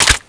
reload open, foley; m79

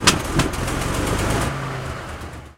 exterior engine switch, tanks; War Thunder

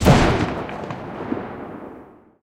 mg 8mm mg34 lastshot; guns; tanks; War Thunder

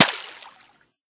water; Escape from Tarkov

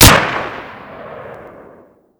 wpnfire kriss; weapons; Modern Warfare

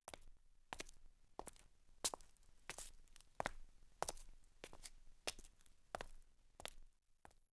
Footsteps; Civ4 warlords

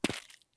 run asphalt; Escape from Tarkov

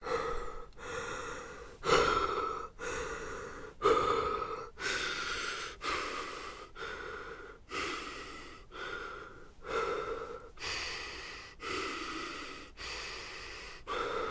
breath ok; Escape from Tarkov; scav3

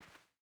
footstep barefoot cloth walk; Rust

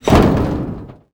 sheetmetal jump

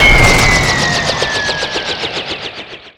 heli shutdown; Call of Duty Modern Warfare

